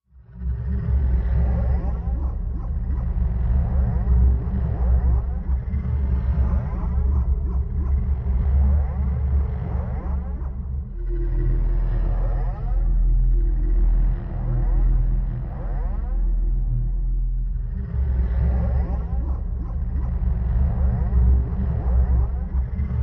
Canine Dream, Machine, Low Pulse, Bark Like, Distant Alarm